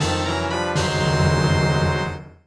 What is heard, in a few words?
Catch Item; Nintendo 64; Legend of Zelda, The - Majoras Mask